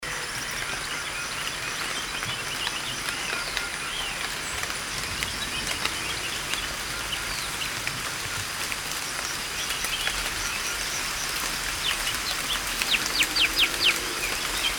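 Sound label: rain forest monsoon birds; atmosphaeren umwelt; dschungel regenwald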